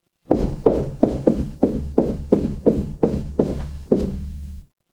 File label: footsteps concrete